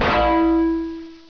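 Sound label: CRITICAL ERROR; braillenote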